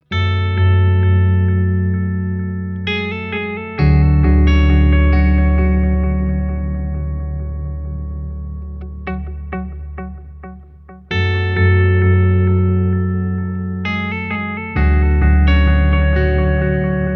clean Heavy Octave analog Delay Modulated Reverb; Boss Katana Artist

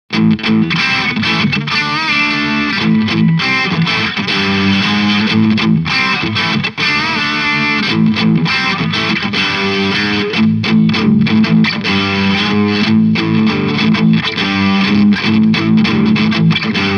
Boss ME 90 Brit Stack

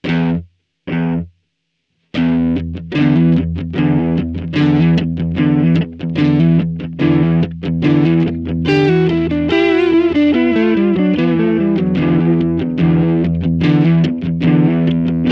Vox AmPlug Brighton Delay

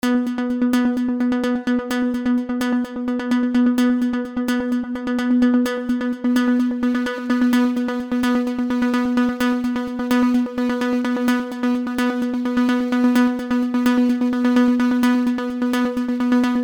Avenger 2 Comb Filter Chorus; Vengeance Sound; Melodic Tech Voices 1

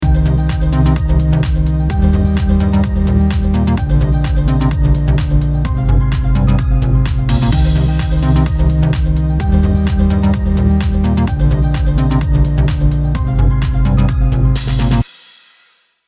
CLA 76 No Comp; Waves

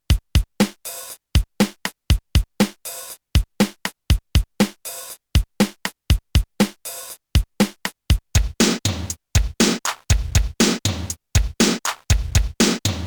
Drumbank; Mega Synthesis

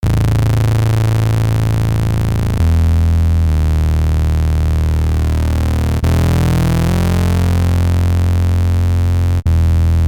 Behringer K-2 MK-2 16 double saw

